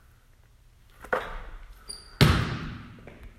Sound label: basketball